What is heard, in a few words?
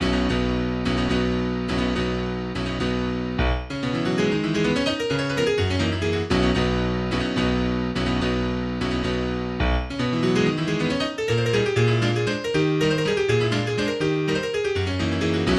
Mazurka in A Flat Major Animato